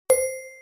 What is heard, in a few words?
mail